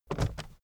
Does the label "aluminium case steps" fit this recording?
Yes